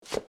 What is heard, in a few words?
Concrete Heels Scuff; コンクリート ヒール 擦れ音